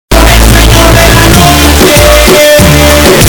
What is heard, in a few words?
bassss bosted